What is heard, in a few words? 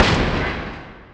asteroid damage metallic big; Factorio